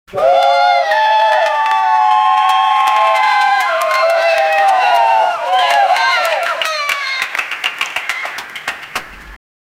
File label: cheering